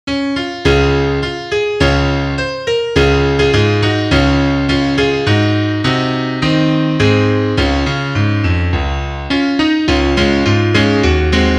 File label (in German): Klavier; muss i denn